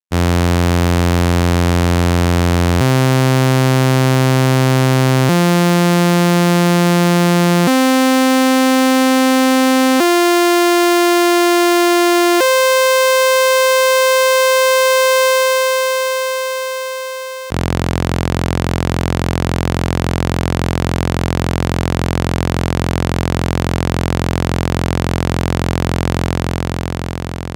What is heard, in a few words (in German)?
Sägezahn A